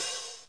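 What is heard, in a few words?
HAT open, Kawai R50 drum machine; DRUM MACHINES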